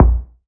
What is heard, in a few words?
BD, BASS DRUMS; EdgeSounds